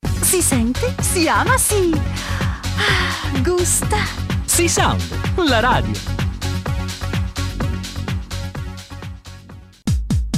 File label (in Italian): JINGLES GENERICI SEQUENZA